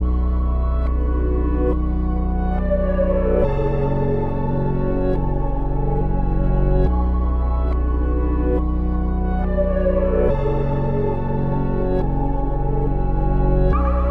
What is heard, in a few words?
140 BPM F# Min, Trap Melody Loops; Cymatics; Nightmares Trap Melody Loops